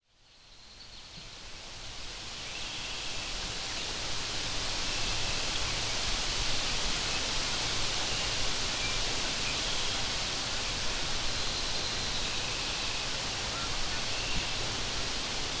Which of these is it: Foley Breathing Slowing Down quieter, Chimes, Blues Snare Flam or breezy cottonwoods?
breezy cottonwoods